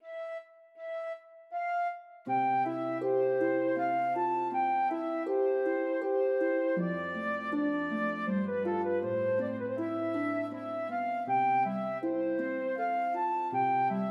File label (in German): Serenade für Flöte und Harfe